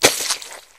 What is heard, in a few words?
Ui Collect Slime; Superb; Nickelodeon Super Brawl Universe; Android